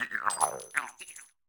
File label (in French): Cri Marche